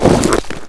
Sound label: squash